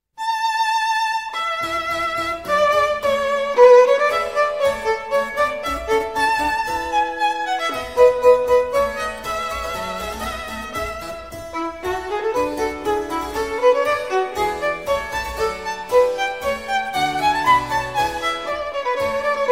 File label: Handel Violin Sonata In A Allegro; Op. 1-3, HWV 361; 2. Allegro